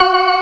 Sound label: organ, all stops; Keith Emerson Signature Series